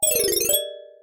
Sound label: Reset Button pitch; phet io wrapper sonification